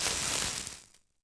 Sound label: Bomb Fuse; Civ4